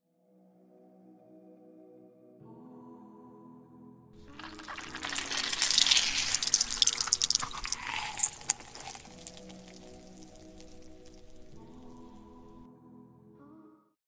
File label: Lemon squeeze; چلاندن لیمو